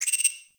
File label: Tech One Off, Ambience; Railjack